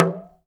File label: TALK DRM, Heart of Africa; Spectrasonics